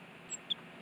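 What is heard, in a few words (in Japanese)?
シジュウカラ, 【どけどけ、交代しろ】 序列順位が高 いものが餌場に入る直前に鳴く。; 餌場では頻繁に使われる。; Parus minor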